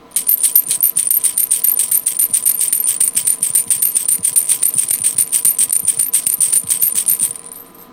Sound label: rattle